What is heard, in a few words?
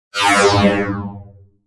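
Stab Short High Frequency Electronic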